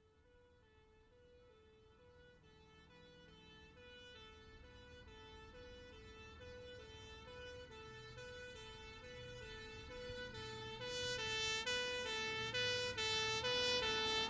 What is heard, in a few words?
Fire Engine, Pass R To L With Two; Emergency; BBC Sound Effects Library